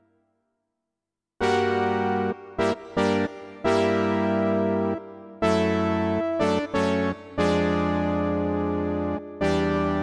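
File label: Reso Syn Brasss, digital piano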